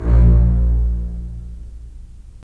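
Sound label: STR, strings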